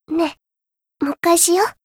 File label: applause